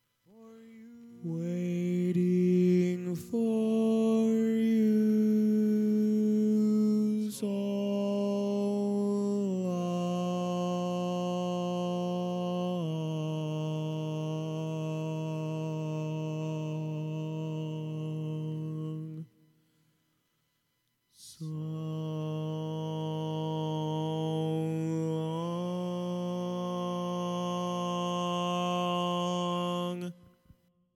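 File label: Baritone, barbershop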